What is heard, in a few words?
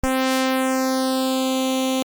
SAW SAW